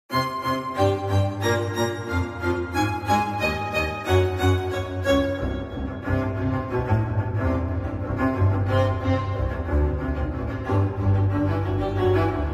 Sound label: divertimento for strings